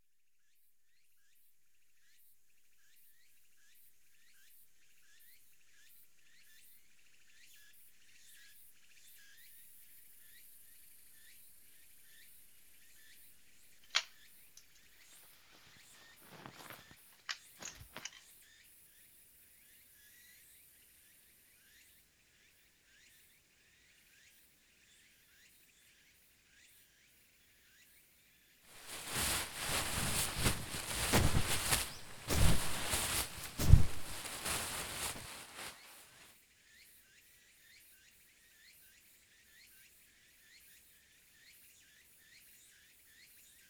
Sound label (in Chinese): 草皮絨毯、星空天幕 搭帳篷→露營; 在道房山露營場搭帳篷→露營; 蓄音レヱル; キャンプ